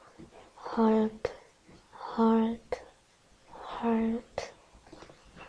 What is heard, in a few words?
harp